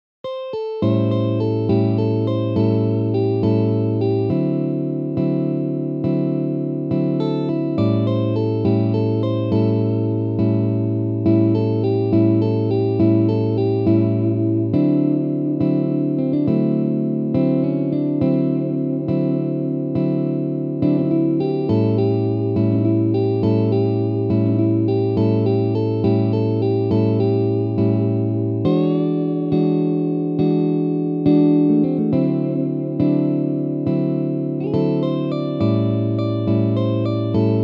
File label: Easy Blues Solo